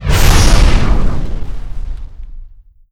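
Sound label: explosion pipe burst decay; Explosions; Call of Duty Modern Warfare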